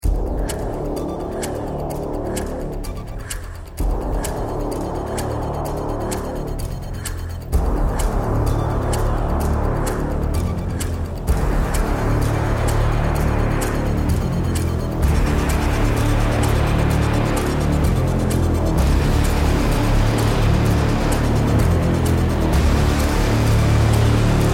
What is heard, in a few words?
bomb planted